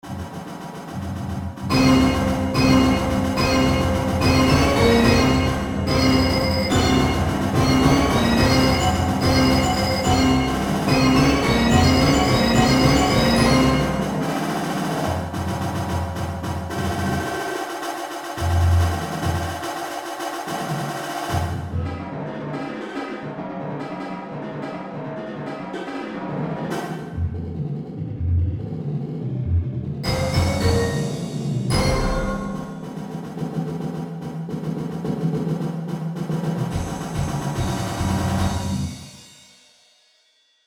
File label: drum break